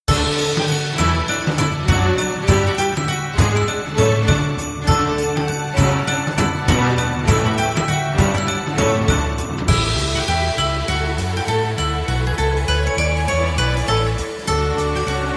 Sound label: Deck the Hall (jazz classic)